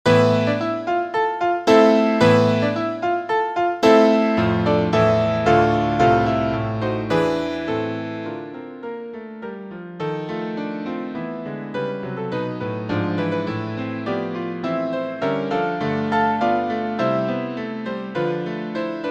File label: japanese traditional; ha uta leaf song